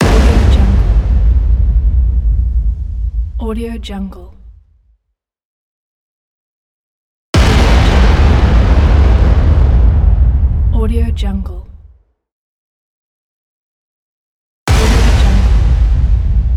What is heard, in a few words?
big impact